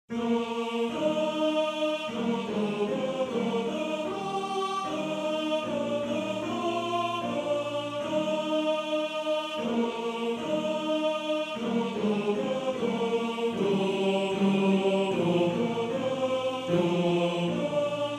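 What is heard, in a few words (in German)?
Mys Hüsli A Tenor 1, Männerchor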